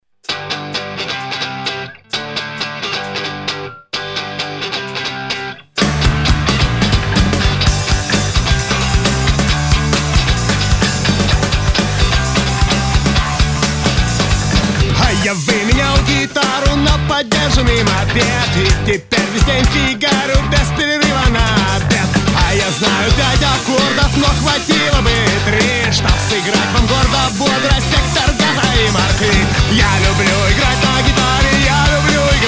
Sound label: guitar